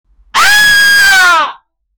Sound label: woman scream before getti